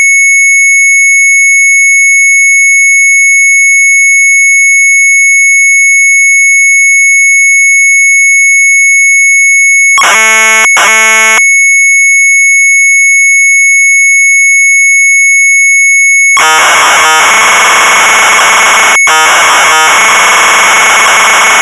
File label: MZ800 Tape; HCOY PLOTTER; retromelsarchive; sharp mz800